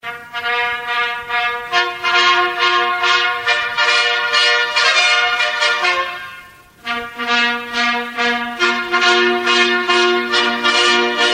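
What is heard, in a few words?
fanfares award ceremony; zvukovoye oformleniye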